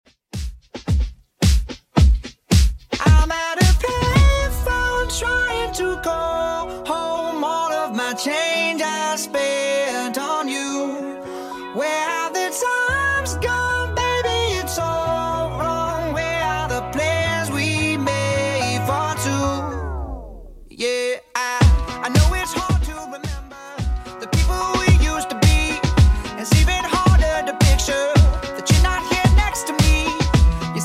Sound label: Redrum 110bpm